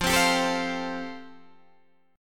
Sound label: F Major Add 9th, Guitar Open D